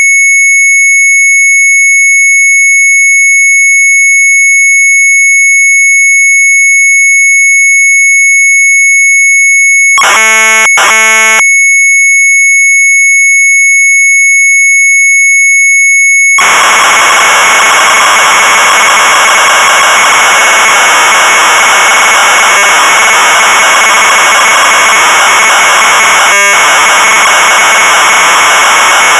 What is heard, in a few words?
MZ800 Tape; CMT INVUL-1; sharp; retromelsarchive